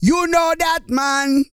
REGGAE, Vocal Planet World Voices

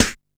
Snare, Drum & Bass

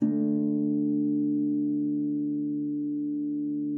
FLAG C#3, harp; Xsample VOL-8; HARP+FX

